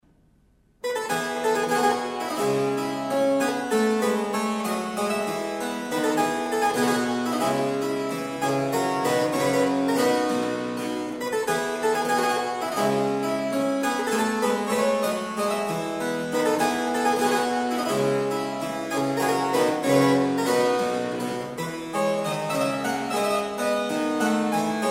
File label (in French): branle basque; Louis Couperin